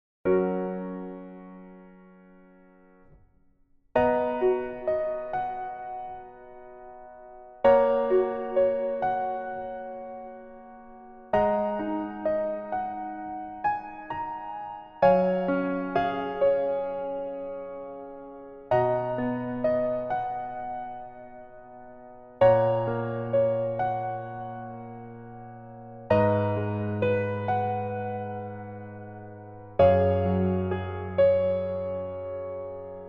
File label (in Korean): Piano ver 여자키